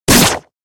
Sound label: sword